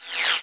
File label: holo off